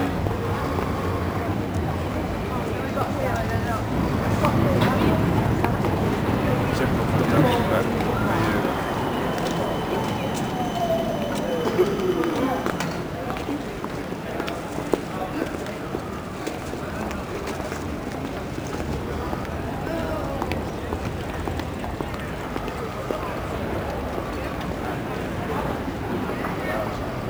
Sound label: Amsterdam street trams